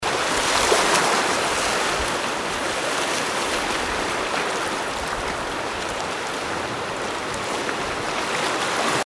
自然の海の音; Nature and Field; Nature and Field Sound Effects

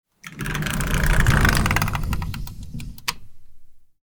横開きのドアを閉める音; 日常生活的音效

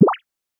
ぴょこ

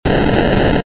ゴゴッ 動かす レトロ 中

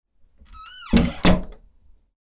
ドアを閉める音; 日常生活的音效

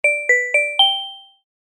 場面切り替え ベル

